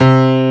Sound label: PIANO; Activity Fun with Cinderella & Friends; DirectX